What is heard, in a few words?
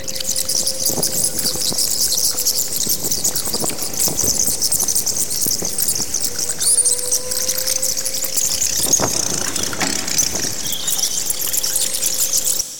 bats squeaking